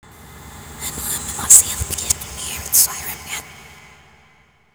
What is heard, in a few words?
reversed; slender